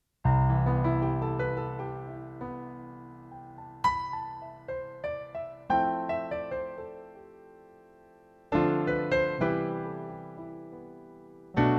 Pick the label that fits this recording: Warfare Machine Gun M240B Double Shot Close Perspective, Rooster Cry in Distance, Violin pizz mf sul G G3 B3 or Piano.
Piano